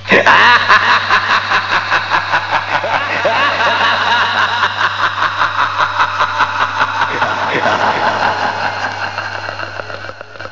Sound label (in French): RIRE; EXTASIA